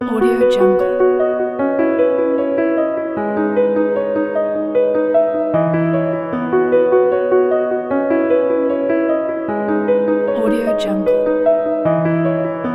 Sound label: romantic loop